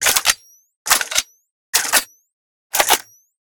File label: AR55 cock